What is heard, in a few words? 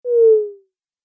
Multimedia Rollover, mouseover sound; Business Programs, Apps And Computing Noises